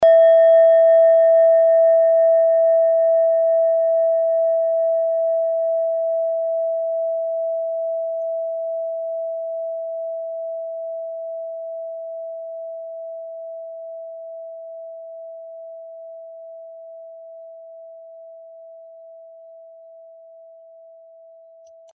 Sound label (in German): klangschale nepal, Klangschalen Nepal